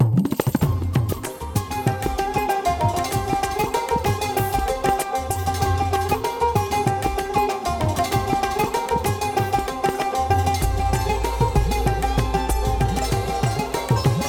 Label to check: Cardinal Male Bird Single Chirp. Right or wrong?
Wrong